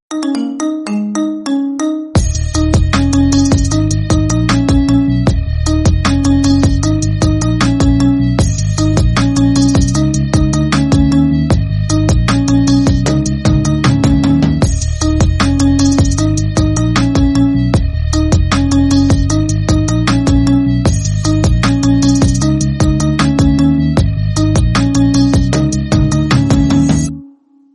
Marimba Remix Instrumental

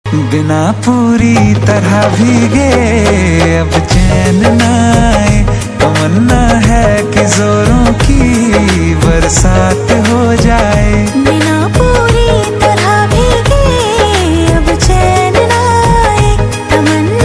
Love Song Ringtone

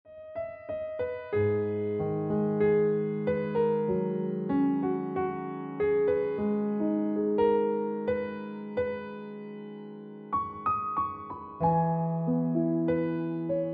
Ringtone Piano Music

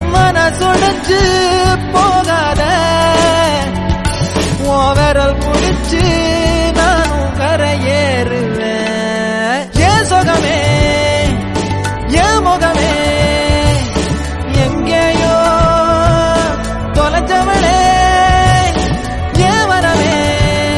Tamil, ringtone; Manithan

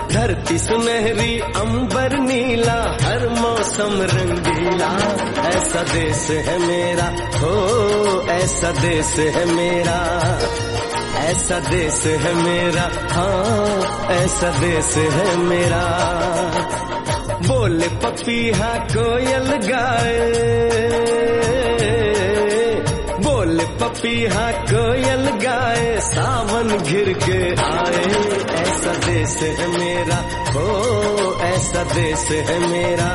Hindi Song; Veer Zaara

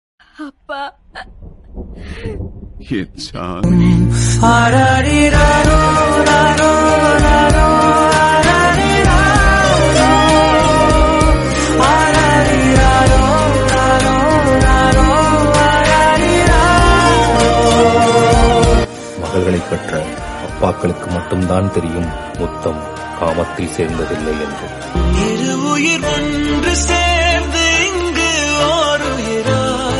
Tamil

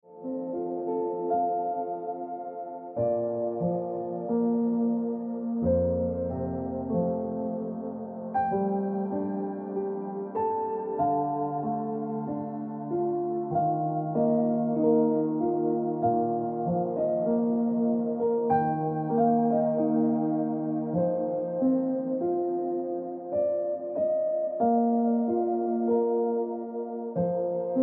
Piano Music

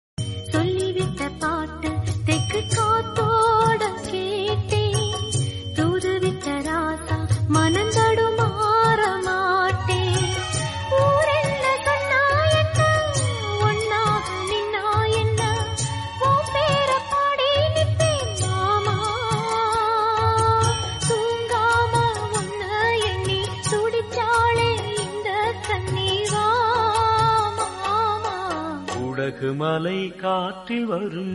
Tamil Song

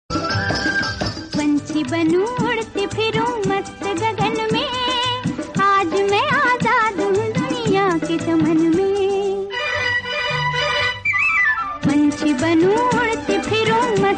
Old Hindi

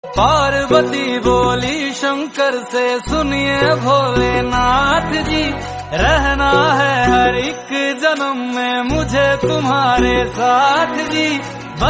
Song Hindi

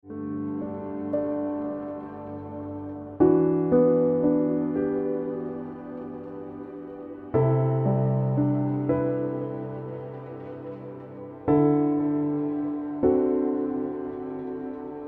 Piano Music

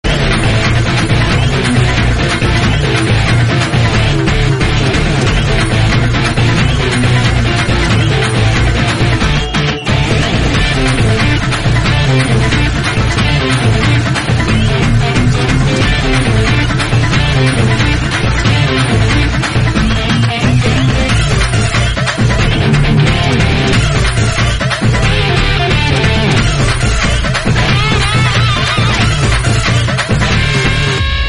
Workout Bgm; Darbar; Thalaivar

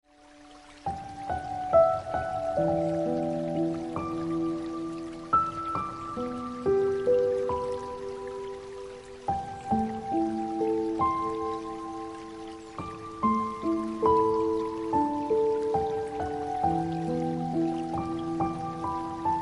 Ringtone Piano Music

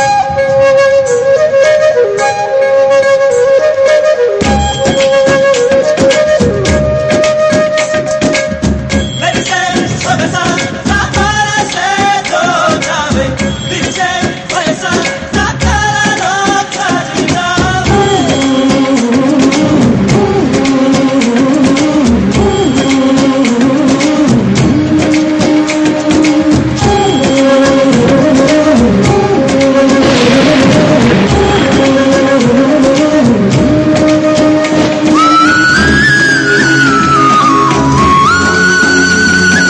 Instrumental Ringtone; Reema Sen; Cheli Movie

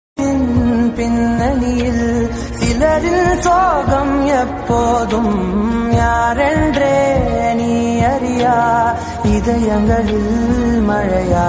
Tamil song, ringtone

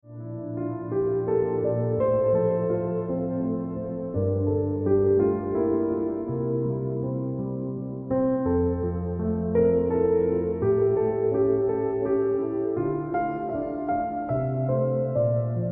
Ringtone Piano Music

